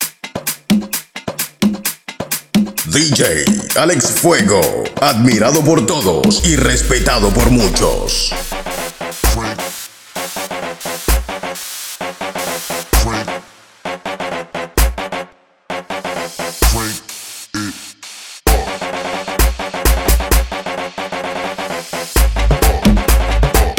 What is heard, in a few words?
130 BPM; guaracha; Prodeejay Remix; Chile